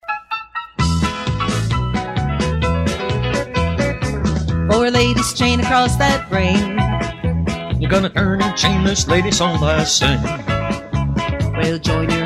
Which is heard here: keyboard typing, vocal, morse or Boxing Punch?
vocal